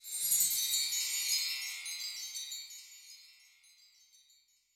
Slo Sprkl; Yamaha DTXpress-II

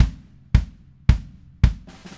Red Maple Groove 110, DW Red Maple Kit

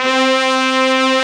BRASS, Brass Bones, Brs Layer

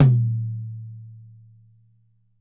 YAM 8X12, Drums; Big Fish Audio; Ross Garfield Drums VOL-2

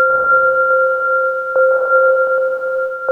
ringing C2

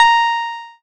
PLUCKED, Synclav FM, A#; Big Fish Audio

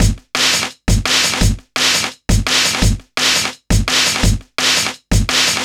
Rough Beat, Drum & Bass; Fluid Dynamics

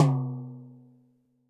TD-20 Tom B, Roland TD-20 Toms

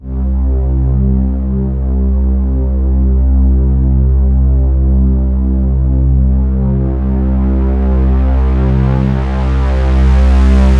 C2, SynthLine; NiceBeats

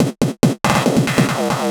Fills, Loops 140 BPM

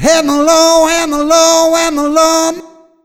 ADLIB MEN, Funky Vocals; USB Soundscan vol.18; AKAI

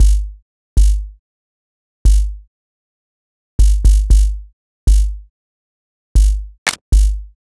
Robot Thunder, Modular Electronica; Sonic Foundry (Sony Creative Software)

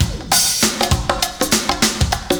PERCS, 70 s Breakbeats

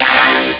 e-scratch; Amiga Soundtracker Sample Packs

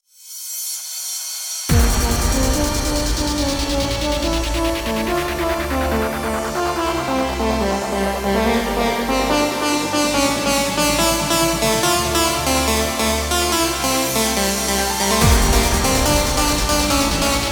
142 BPM PWM Kit Complete Mix; Vengeance - Essential Clubsounds Vol.5; Demo Constr Kits; Root Gmin

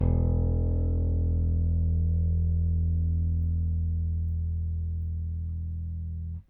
Rick Thumb, Rickenbacker Bass; Airwindows

